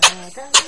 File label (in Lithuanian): kosejimas ciaudejimas vel kosti